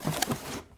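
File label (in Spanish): MONTAR CAJA INVENTARIO, assemble inventory box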